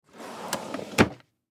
File Cabinet Wood, File Cabinet Wood Top Drawer Open; Office; Blastwave